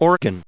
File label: organ